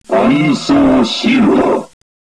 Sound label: taunt